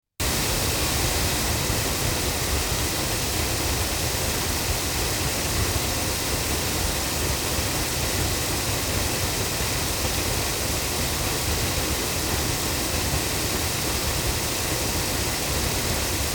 ADK A51TL loudspeakers, microphones recordings

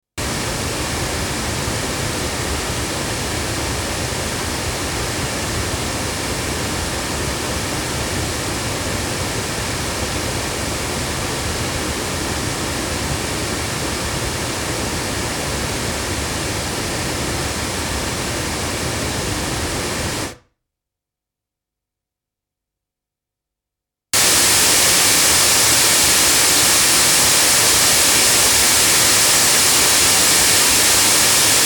back loudspeakers; CT NakedEye; crowley tripp

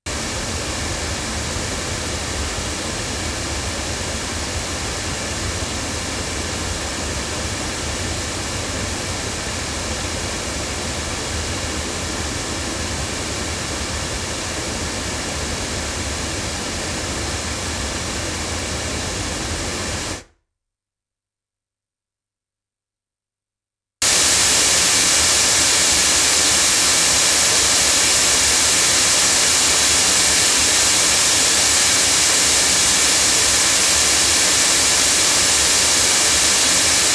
C5000 loudspeakers; JJLabs

